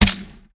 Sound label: MGLMK1